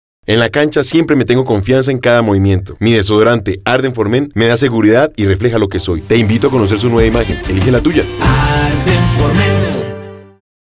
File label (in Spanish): Logo Sonoro; Arden Cordoba TV 10 seg